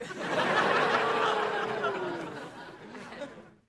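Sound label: Crowd 100 Medium Burst Interior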